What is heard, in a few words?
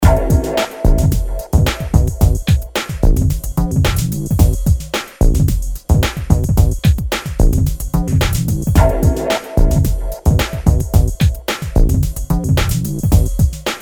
hip-hop